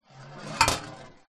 industrial metal ball bearing roll on metal plate surface